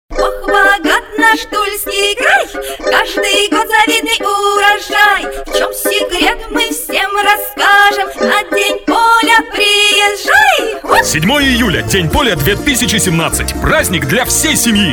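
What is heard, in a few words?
vocals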